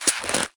feet; wear; CosmicRage

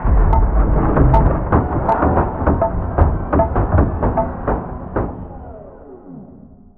upgrade, ships; CosmicRage

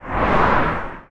car, oldcar; highway; Cosmic Rage

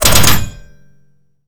metal; combat

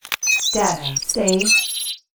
data saved

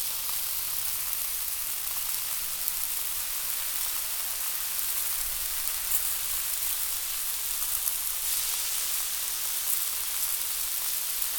cook, grilling; CosmicRage

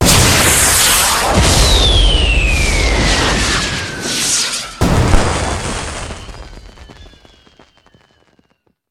big, firework; CosmicRage

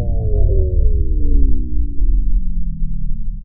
deactivate; Cosmic Rage; activities nebula

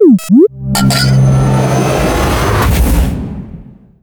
cargo bay filled; Cosmic Rage; ships salvaging

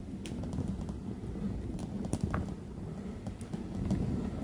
drone wheeling, ships

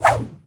foot swing; CosmicRage; general combat; larkan sound improvements

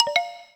game save; CosmicRage

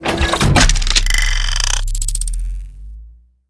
click; nebula

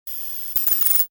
scan, ships; Cosmic Rage; ships misc

